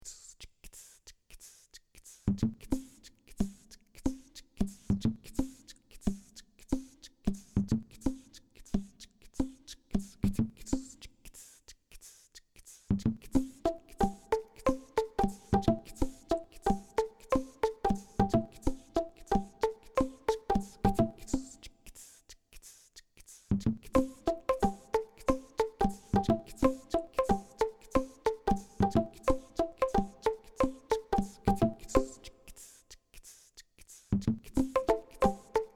Tubes son boogie; Percu; Duperrex

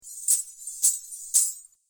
Sonnailles son; Percu; Duperrex

